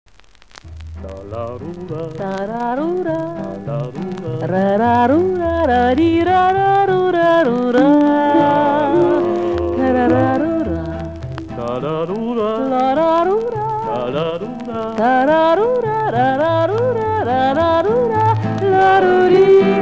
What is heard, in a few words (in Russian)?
Мелодия; Советская эстрада 50-60